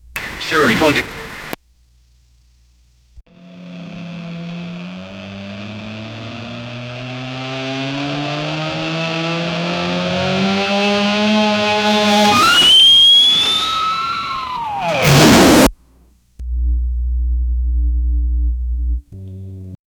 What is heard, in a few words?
aggressive v12 8 gear, engine